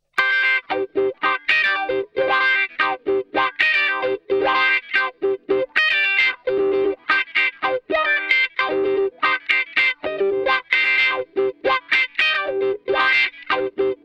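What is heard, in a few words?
112 Cm DISCO ELECTRO guitar, 112 BPM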